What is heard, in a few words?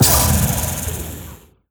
snake shoot; weapons; dota; medusa